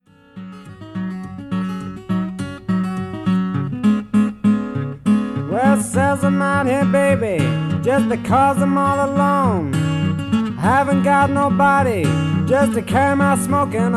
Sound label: cigarette blues